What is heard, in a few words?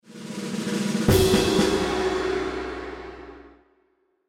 level success; New Yankee in King Arthurs Court